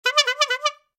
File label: airhorn clown spam